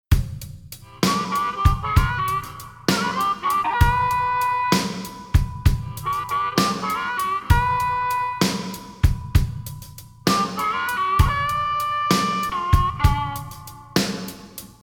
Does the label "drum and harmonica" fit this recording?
Yes